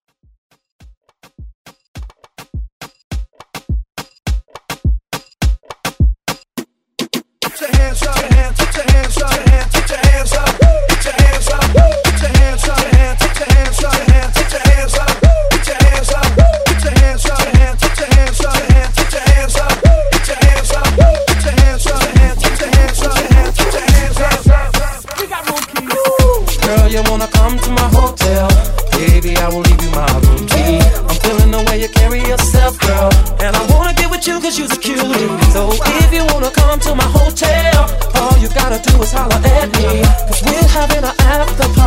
Moombah Vocal Edit

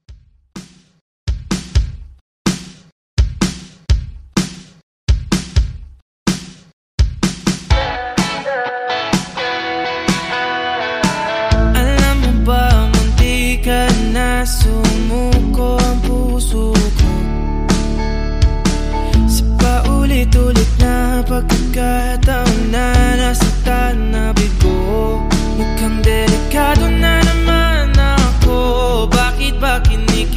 OPM Mixshow Intro 126 Bpm Clean

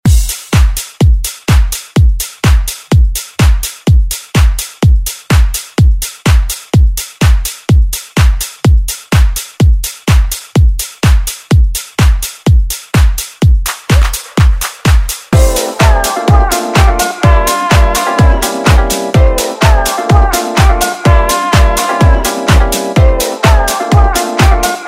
Dance Redrum

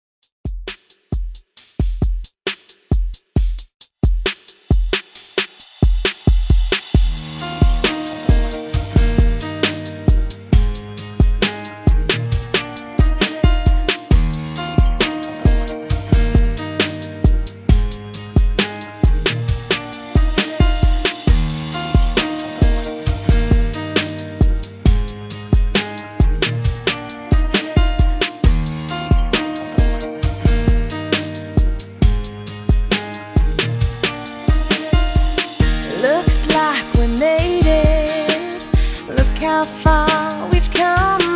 90S REDRUM 67 BPM

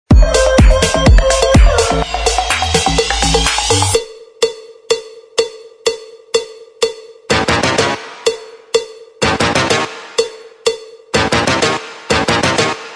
Halloween

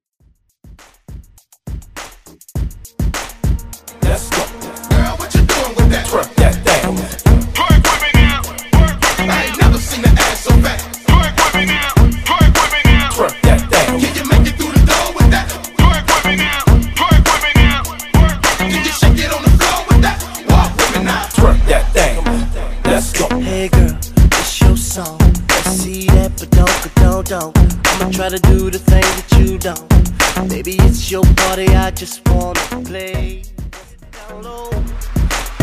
Bootleg Mashup; Trans Clean

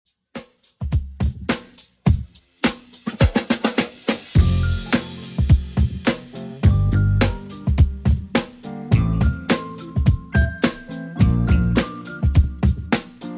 70s Redrum 105bpm

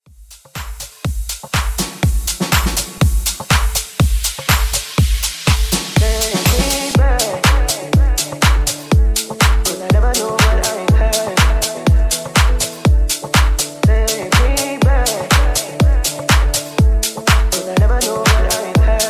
Dance Club House 122 Bpm

